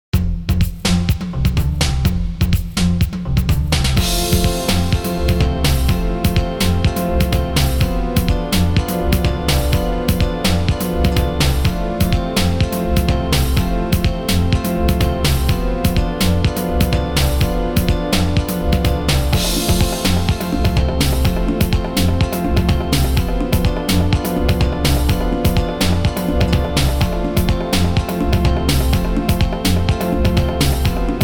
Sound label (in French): VERSION KARAOKE; Alex et Zoé 3